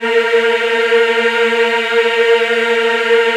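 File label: A#3 men women eh, Choir; USB Soundscan vol.28; AKAI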